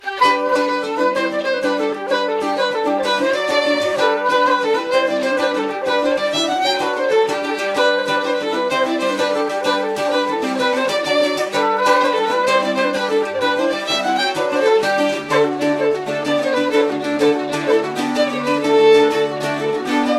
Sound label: Orchestra Ambience; assassin s creed iii